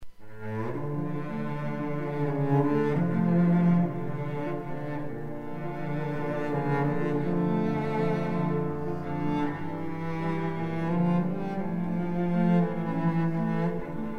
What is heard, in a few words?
Trio; Mendelssohn